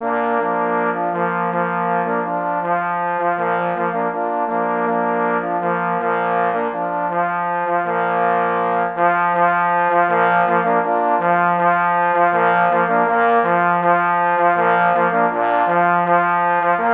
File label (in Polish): Fanfara kynologiczna na 3 parforsy; pies w muzyce lowieckiej